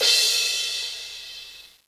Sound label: cym; Angelic Layer